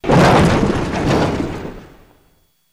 Jeep Chassis Hits Big, hits & rattles

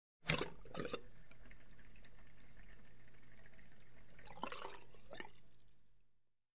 Locker Room Sink Gur, sink

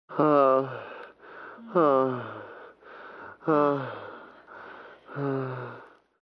Eerie, Multi-pitched, Reverberant Metallic Moans

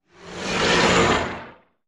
Animal Stinger; stingers & whooshes

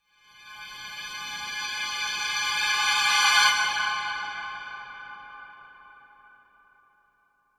Violins Tension Cres; strings

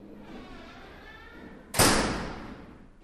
Door Metallic Squea, push bar

